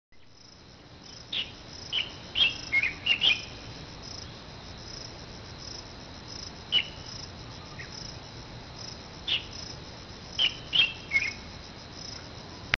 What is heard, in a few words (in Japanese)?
hototogisu